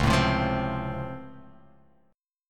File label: C sharp Augmented Major 7th down, guitar chord